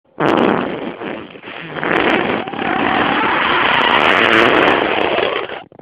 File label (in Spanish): Pedo lluvioso